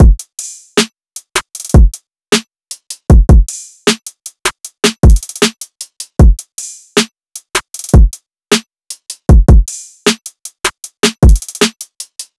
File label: Drum Loop 155 BPM, Trap; Ambush